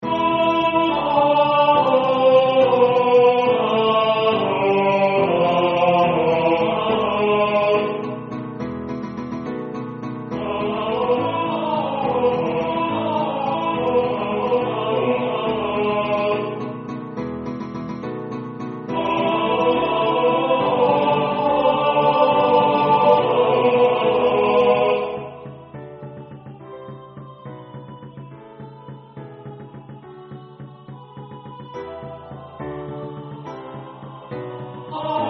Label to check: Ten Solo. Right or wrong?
Right